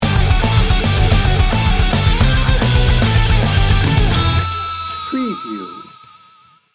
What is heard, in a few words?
metal mayhem sting bumper